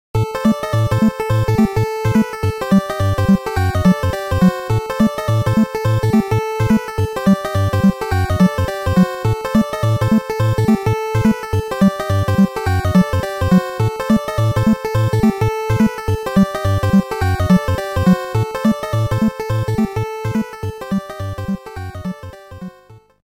NES; Darkwing Duck

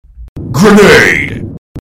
grenade

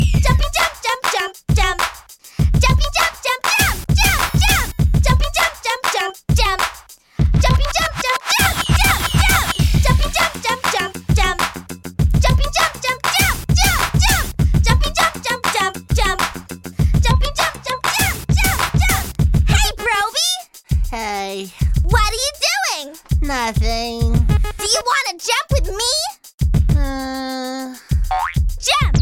jumpy

